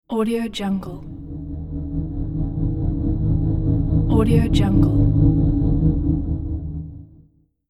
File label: heavenly power aura